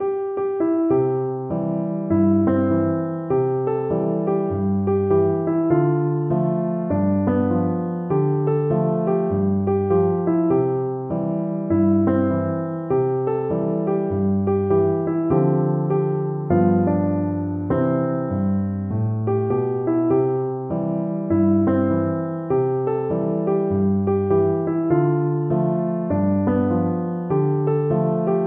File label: easy piano; he's got the whole world in his hands